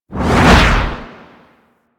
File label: Dodge Roll Left Low; Movement; Warframe; Archwing